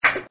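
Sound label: ball low sound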